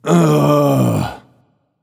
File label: ugh; smite; poseidon